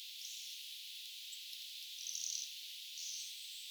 ilm kulorastaslintu

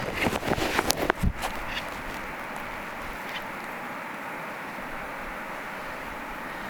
kurppa

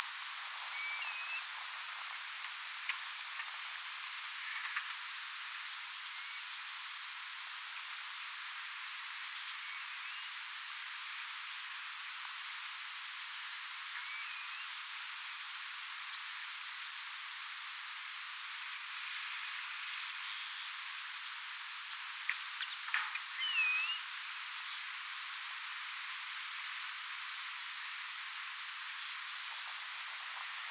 koiras tundrakurmitsa

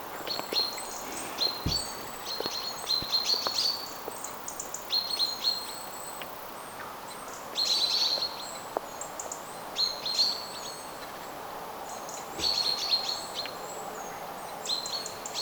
peipot huomioääntelevät

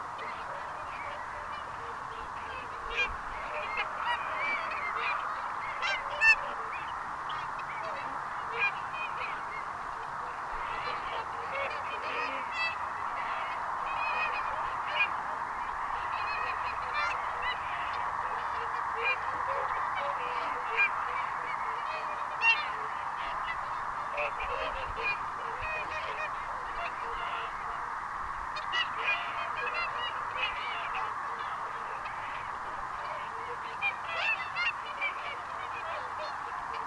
laulujoutsenen poikasten aantelya kuuluu hiukan

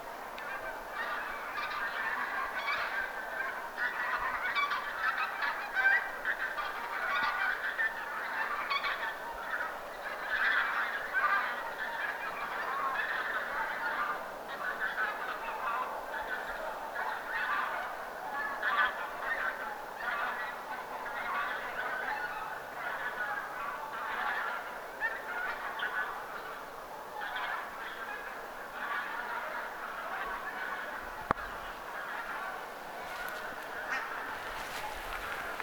metsähanhiparven ääntelyä

